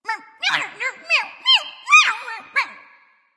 nv grumble; smite; awilix

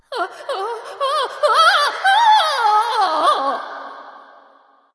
fear light; smite; voicepack; hel

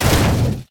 bulk metal hit; Smite; Cabrakan

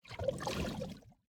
flame trail; Smite; Sol

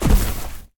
Bodyfall; Smite; god Kumbhakarna

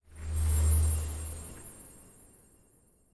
idle orb float; smite; olorun

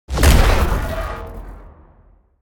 spirit flail impact; smite; osiris

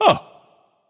notice; danzaburou

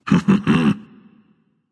heh heh, voice; Smite; ymir skin14

